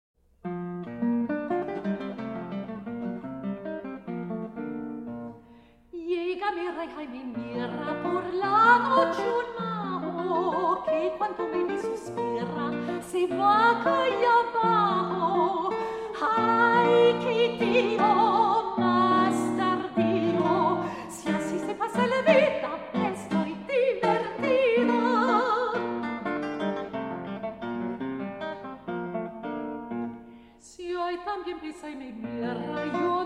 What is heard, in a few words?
Voice; Tonadillas 5. El Majo Timido; Arr. For Voice and Guitar